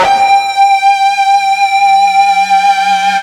FUZZ G 4, Guitar; East West; Steve Stevens Guitar Samples Collection

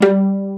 PIZZ VIOLAS; E-MU Formula 4000 Series Vol. 5; Protozoa; Proteus2 Instrmt

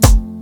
HIT, Hits & Brass

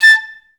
STAC A5; Best Service; Peter Siedlaczek's Advanced Orchestra

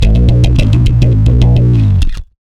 WHA DISTO B; Big Beat Samples & More

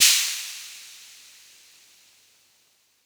HH1, SRB 600

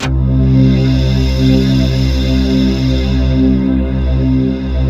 SYN, Roland, digital; Sound & Vision - Gigapack I CD 2

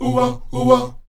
Oh-Wa Oh-Wa, Afro Chants; Roland LCDP11 Africa VOL-1

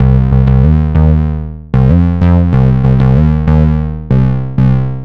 Bassline; Drums & Drones

